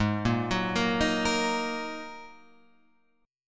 G sharp 7th Suspended 2nd G sharp, down, Guitar, Open F; arpeggiated; chord